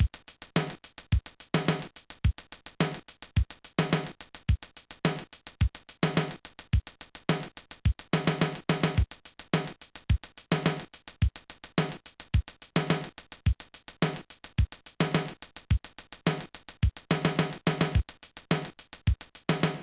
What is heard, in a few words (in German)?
Rythmus; BeatStomper II v1.0; BSII-Patterns